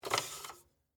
Slide Projector, Reel, Lock; Technology; Projectors; BlastWave

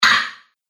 Red Metal Snapper

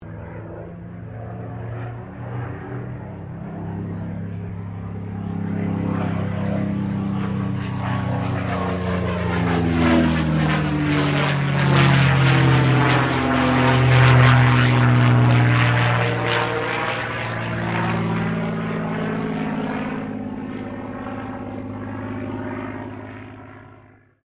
AIRCRAFT CAC CA-18 MUSTANG MK23 PASS STEREO

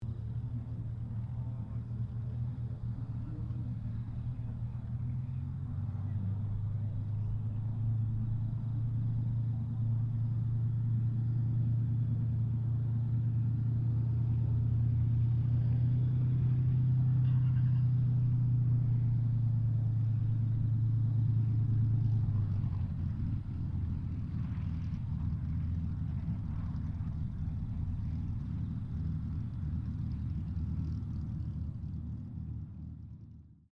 AIRCRAFT SUPERMARINE SPITFIRE MK-XVI TAXI STEREO; Airplanes

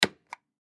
Stamp Self Inking Small Press Down; Office; Blastwave

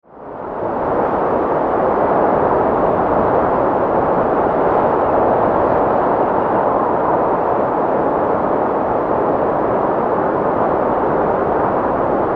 Wind, Storm; 5.1

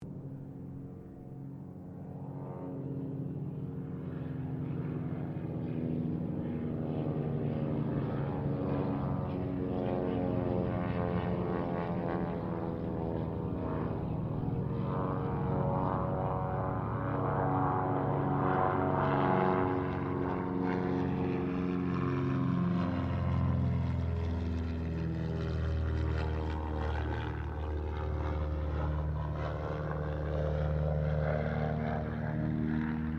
AIRCRAFT BIRDDOG 0-2 TROJAN PASS; Mixed aircraft squadrons; Airplanes; Vehicles

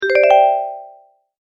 multimedia tone advance; Tones (Sound Effects:Sound Design); McKinney Sound

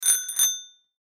Bicycle Bell Ring, Bicycle Bell Ring Bike; Low; Bicycles; Vehicles; Bicycles (Sound Effects:Vehicles); Blastwave

